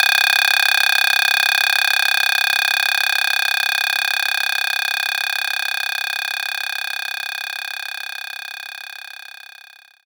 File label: Bongo Rim; knights try